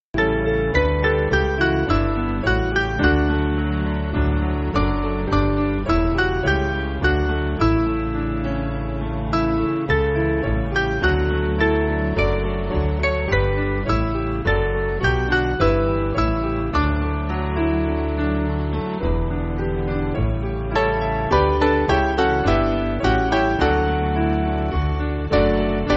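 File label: Piano, Music Backing Files for Churches; How Beauteous Are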